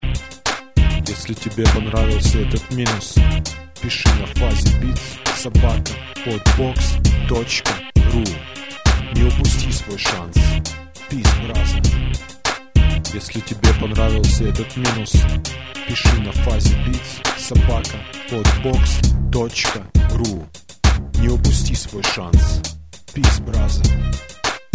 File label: bounce